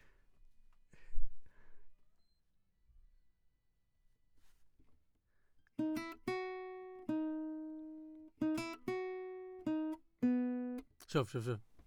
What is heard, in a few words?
Classic Guitar